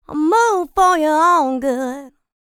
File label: licks, vocal, dance; ILIO; Vocal Planet